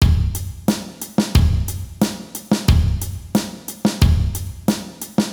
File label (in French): Rythme exo